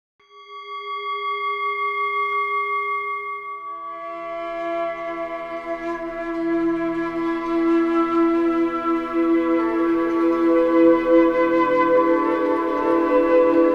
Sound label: Quantum Sound 333 Hz